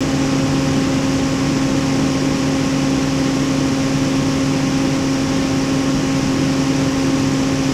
fan hum; E-MU Producer Series Vol. 3 – Hollywood Sound Effects; Ambient Sounds

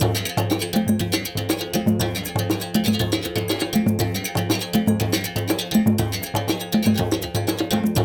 120 Berim. Samba; Spectrasonics - Supreme Beats - World Dance

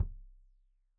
Kik Stereo; Deeply Sampled Drums; SMDrum

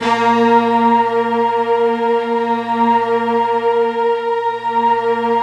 sky choir, Deep Sky; Optical Media International; Sonic Images Library

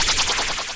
noise fx; Masterbits; Switch FX

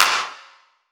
D&B Clap, Drums Hits Claps; neuro

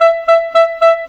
Clarinet, Cinematic

